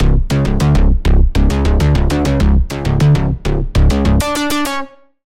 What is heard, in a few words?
Bass, Sylenth1; Routed Sound; Sylenth1 Expansion - Doom Carpenter Vol.1